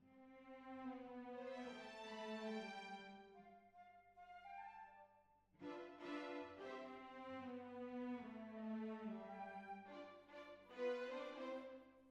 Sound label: Cbasses